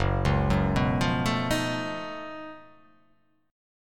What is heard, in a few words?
Gb Minor Major 13th, GbmM13 chord; arpeggiated; JGuitar